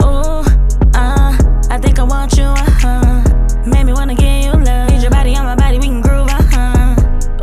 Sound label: RNB